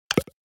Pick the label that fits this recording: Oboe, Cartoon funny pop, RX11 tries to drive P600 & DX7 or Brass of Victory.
Cartoon funny pop